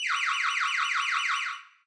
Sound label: amb Police Siren 3 Oct Up; hotline2